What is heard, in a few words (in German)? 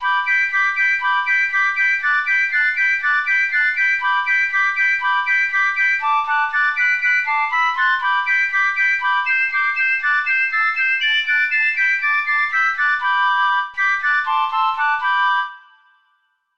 akkorde gebrochen total